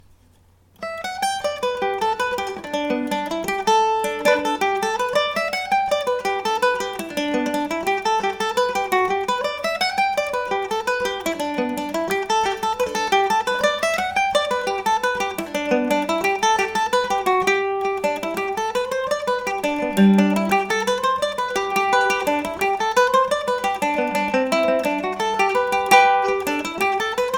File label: jig, mandolin; joe crillys jig